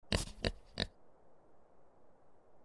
pig; Farm

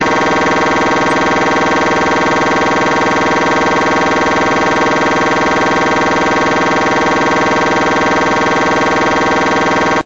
trans loop

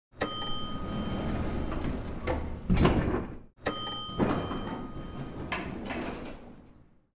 elevator

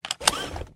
belt out, Speedo

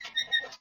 wheel, Mechanic

